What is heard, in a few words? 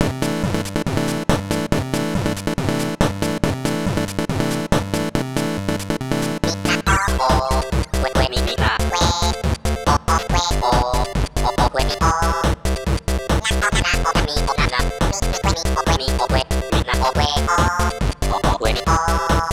NES Remix